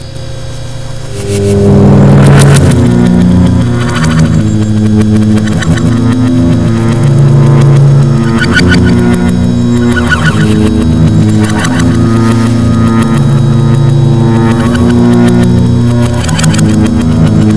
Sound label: hell sounds